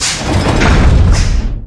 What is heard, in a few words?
metal door; de code